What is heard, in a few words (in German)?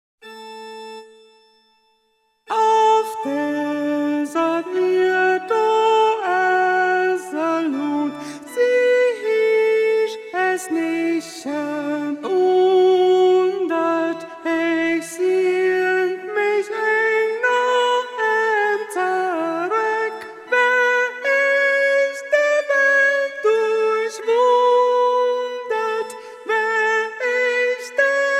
Sopran, chor